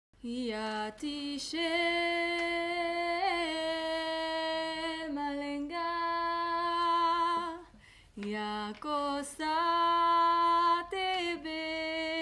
voix

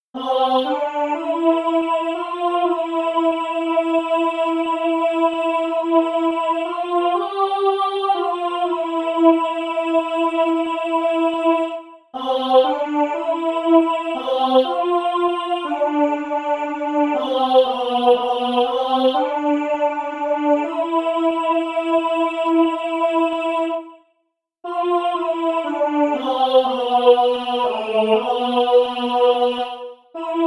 voix basse

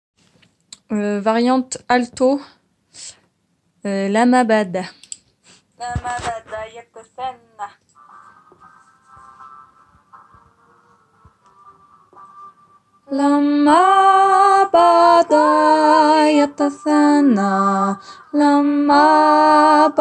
voix 2

